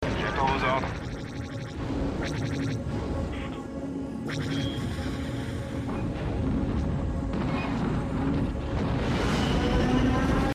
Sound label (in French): Vol en Snowspeeder; Star Wars